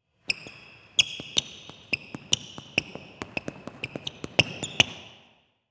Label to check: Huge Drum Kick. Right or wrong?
Wrong